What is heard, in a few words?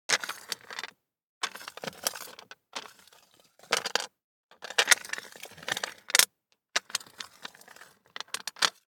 DICE on Glass, Slide, Standard, 6 Six Dice; Samples; ArticulatedSounds; DICE Sfx Party (Extended)